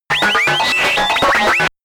calculator high fast, roboter; comedy-cartoon; soundpool

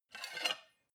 cutlery take from plate slide, gastronomie; berufe; soundpool

